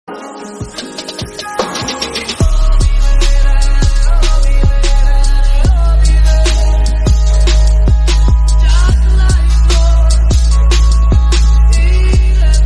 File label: Mood off Ringtone horror; Joker